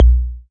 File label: SC40 BD, Pearl SC-40 Kicks; drum machines